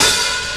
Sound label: wet open hi hat sound f sharp key